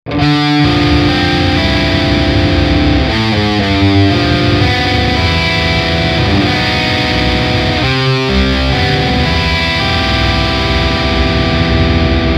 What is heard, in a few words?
High Gain Jazz Chords SG